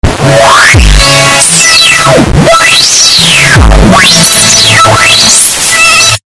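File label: high volume bass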